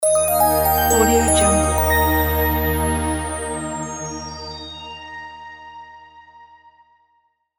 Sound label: corporate logo